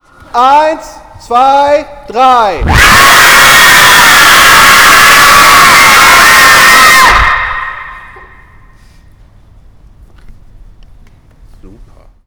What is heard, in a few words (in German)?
Geschrei; St Michaelisdonn